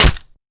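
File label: melee character gun small steel; viper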